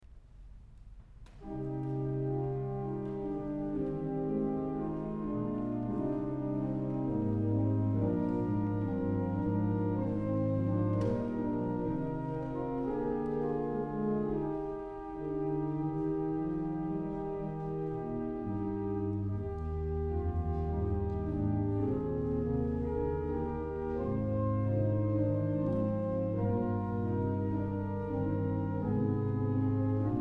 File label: Abide With Me Chorale; James Woodman B. 1957